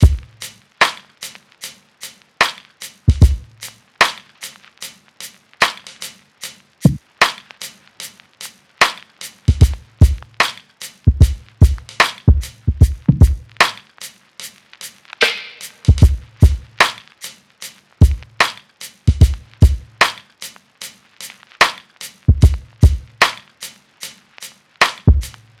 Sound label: drums 75, Full Drum Loops; Slate; SEISMIC Drum Sample Pack